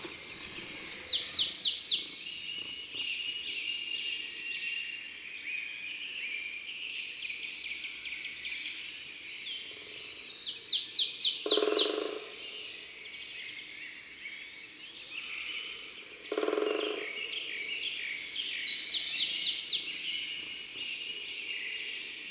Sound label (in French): Mesange noire Concerts Naturels de la Drome; Studio les Trois Becs